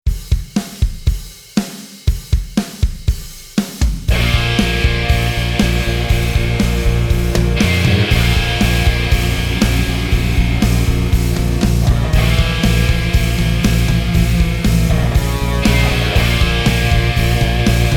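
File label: Lead Guitar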